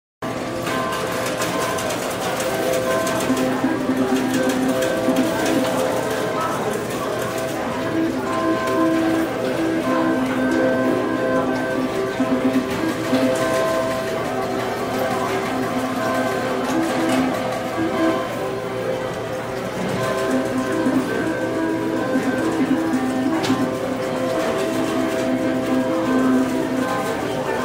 Amb Casino; Family Guy Back to the Multiverse

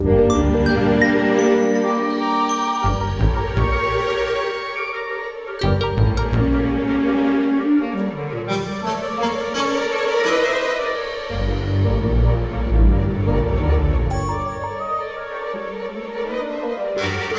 Gnome Traverse Loop; Plants vs. Zombies Battle for Neighborville

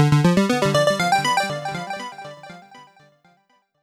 Goal; TorqueL Physics Modified Edition

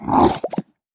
Fishmen VO Attack; We Are the Dwarves

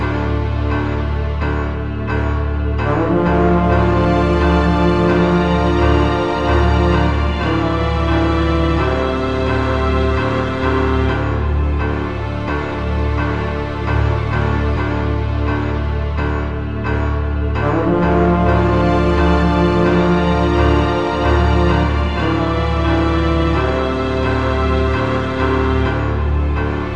Son'a Colony - Day Suspense

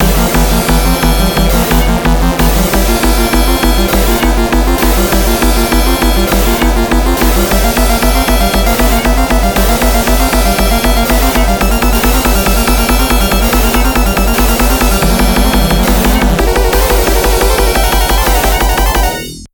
Bomb

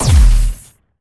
Sci-Fi Weapons-Assault Rifle-Single Shot

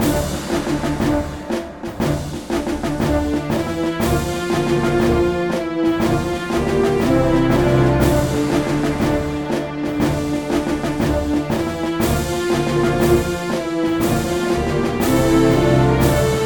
Win Glory; 1914 the great war windows gamerip 2002

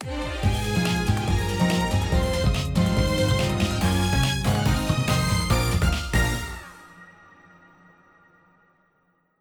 Jingle, Shift Clear; Dirty Job

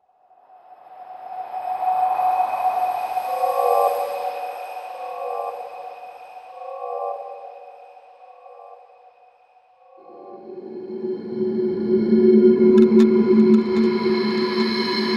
74 BPM; ForestFuture

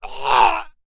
human scream pain choking male

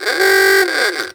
Horn

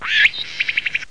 Cicada

